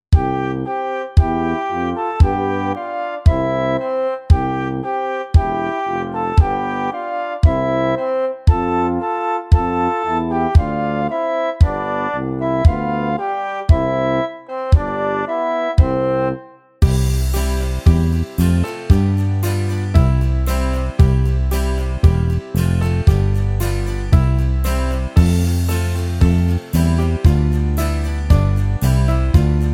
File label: Karaoke